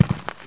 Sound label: body drop